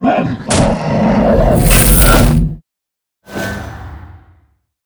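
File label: guardian sobek death, monster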